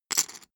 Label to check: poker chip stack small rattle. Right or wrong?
Right